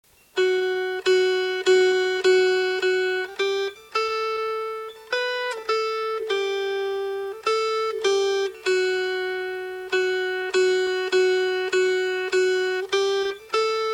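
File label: Melody, Dulcimer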